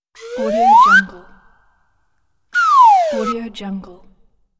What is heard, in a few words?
cartoon whistle glissando